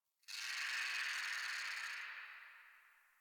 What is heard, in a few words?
Tree Distant Critters Happy Calm, Ambience; Warframe; Duviri